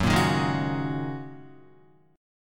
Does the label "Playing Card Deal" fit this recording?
No